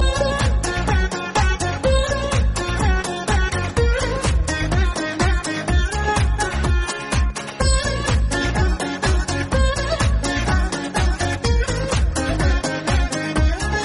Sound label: music bit; Eega